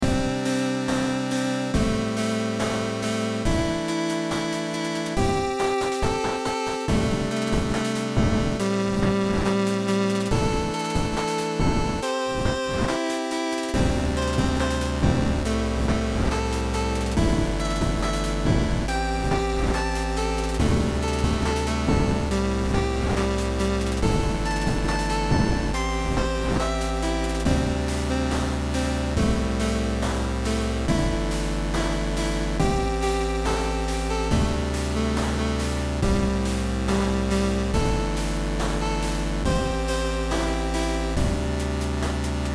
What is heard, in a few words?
BeepBox Song